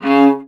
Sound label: C#2, Viola Marcato; Orchestral; DirectWave; Varazdin Orchestral